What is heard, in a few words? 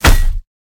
hit; Smite; god Kuzenbo